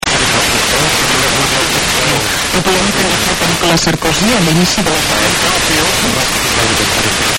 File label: ping